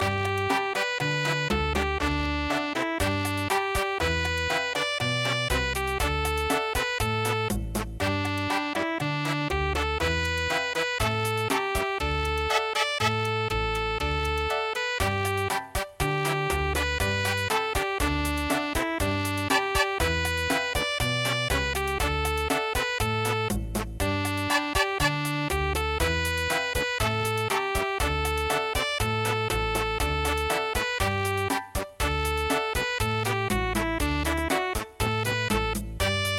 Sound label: winter night chachacha